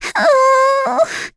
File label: Vox Casting; en; Lavril; kingsraid